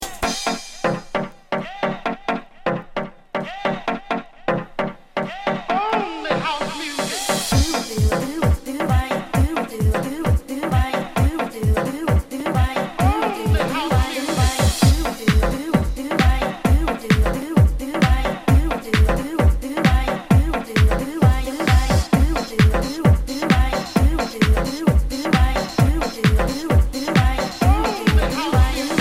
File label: dj remix rock song tone ringtone